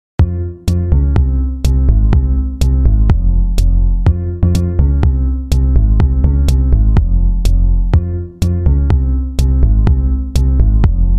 Noteblock

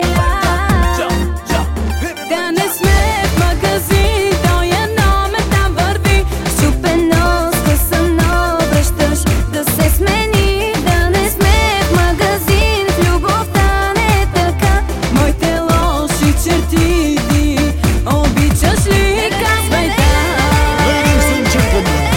Music

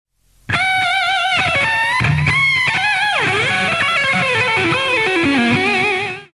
Metal shred guitar

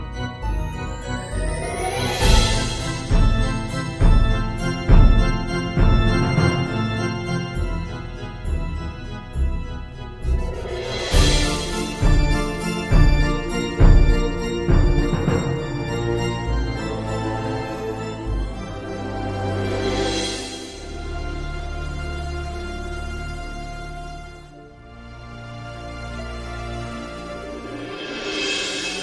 Carnival loop

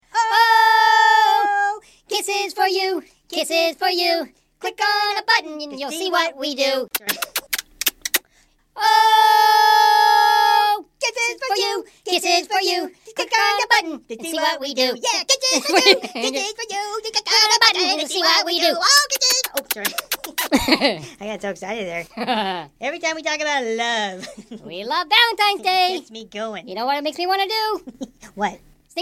Kisses Song

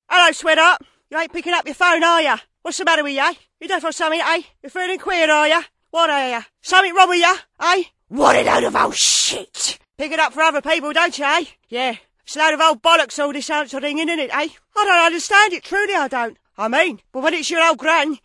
Funny Gran

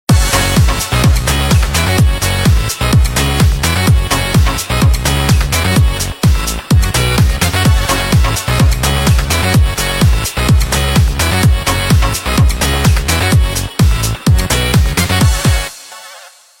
New Dj Remix

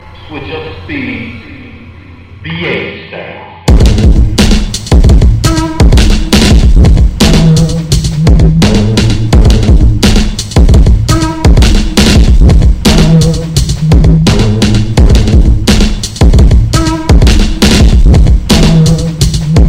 Breakbeats

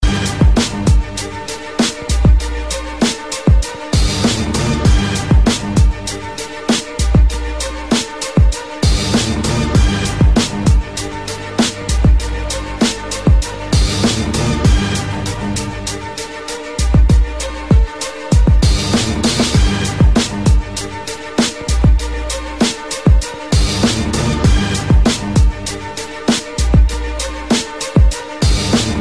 Cool Beat

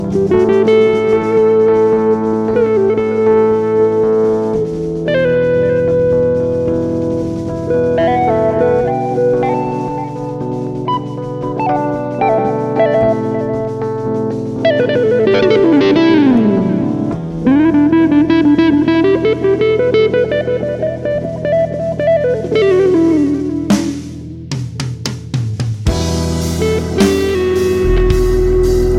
Relaxin Guitar

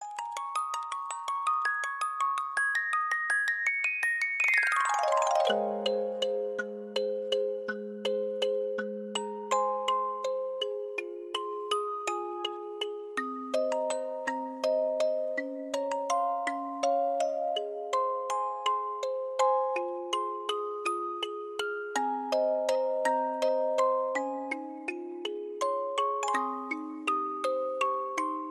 musicbox; Yuri on ice

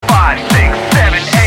8 count music

